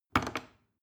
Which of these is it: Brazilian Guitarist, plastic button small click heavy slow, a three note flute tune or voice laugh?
plastic button small click heavy slow